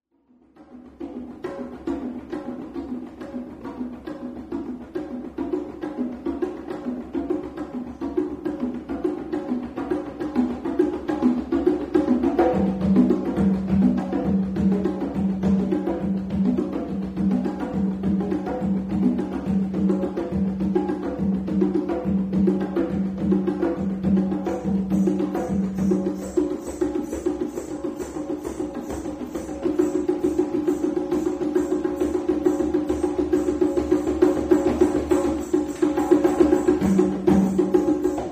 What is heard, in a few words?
Conga stuck